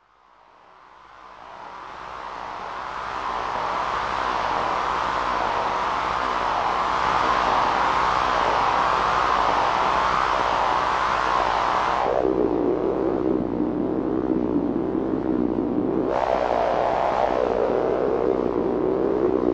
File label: Formanta Arton ME01; synth demos